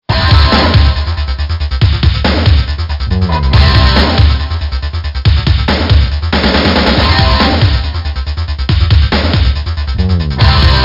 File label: Pump Tune